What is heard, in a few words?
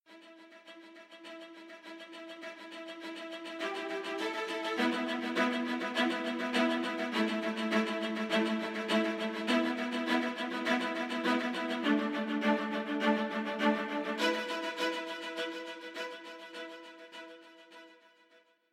viola spiccato